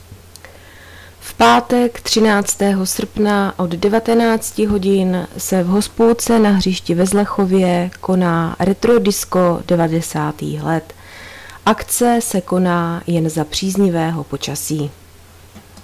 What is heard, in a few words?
retro disco